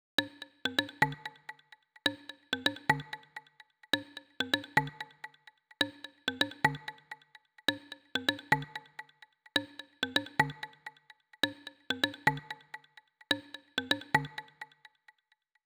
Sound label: 128 BPM Percussion; Vengeance - Essential Clubsounds Vol.5; Old College Kit Root G; Drums & Perc